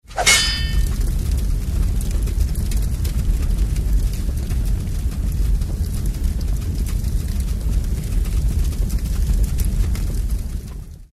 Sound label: intro outro sfx; ChiYou